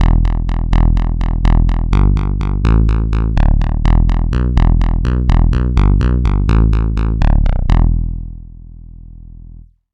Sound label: synth bass